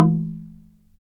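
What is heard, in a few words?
Slit Drums, soft; Roland LCDP11 Africa VOL-1; Wood & Metal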